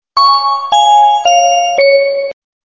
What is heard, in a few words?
beep; baresip